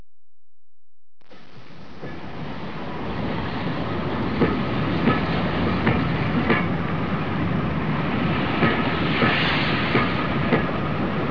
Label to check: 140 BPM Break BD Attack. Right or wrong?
Wrong